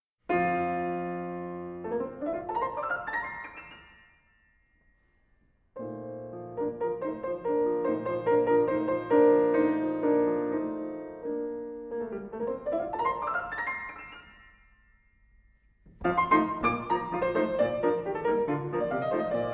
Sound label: Polka Enfantine; Glinka